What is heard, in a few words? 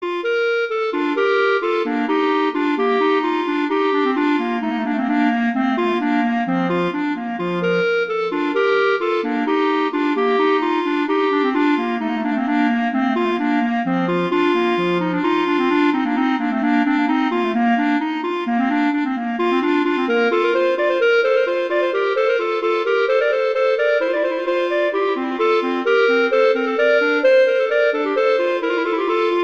Allegro; Telemann; Canonic; Sonata 1